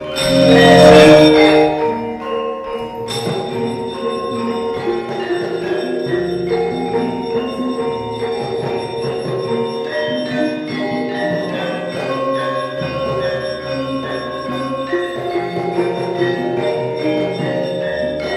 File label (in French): Indonésie Jogja théâtre de marionnette